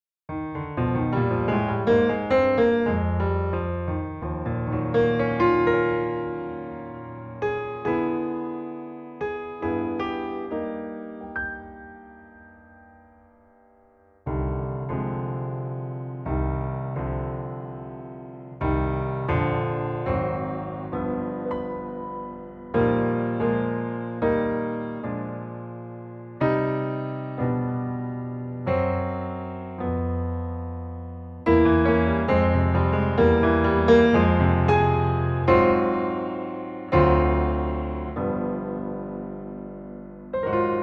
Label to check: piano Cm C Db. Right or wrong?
Right